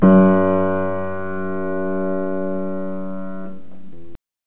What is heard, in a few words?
Piano